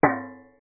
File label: Metal Impact; industrielle baustellen